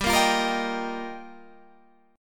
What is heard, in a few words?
G Minor 7th Sharp 5th, Guitar, Open G